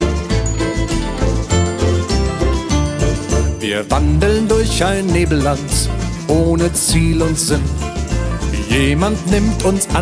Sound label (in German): Lied